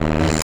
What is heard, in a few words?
Static; Blastwave